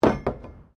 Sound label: PLASTIC BARRICADE CABLE HIT; Material components for constructing more complex sounds